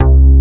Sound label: BAS, Bass Analog & Synth, C2; USB Soundscan vol.12; AKAI; VINTAGE